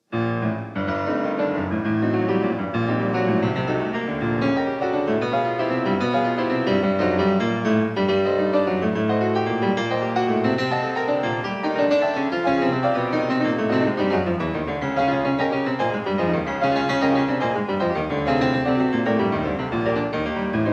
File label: Prelude in G minor; F. Chopin; 24 Preludes, Op 28